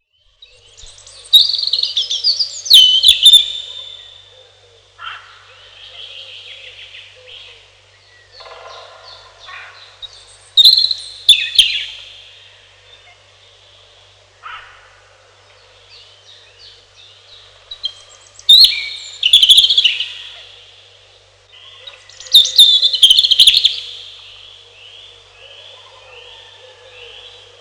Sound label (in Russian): малиновка, birds